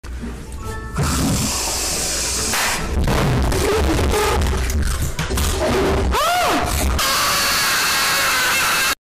scream from kid

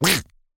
duck quack

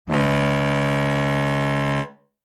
circus ship horn

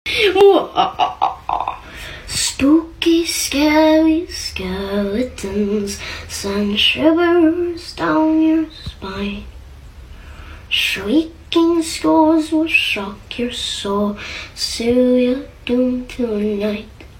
kid

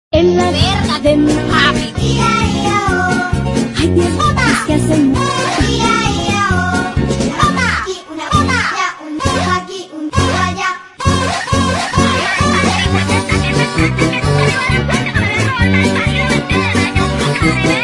farm spanish

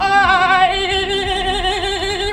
long noise; jerma

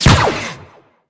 blaster; jyn erso

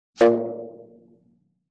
bass string donk

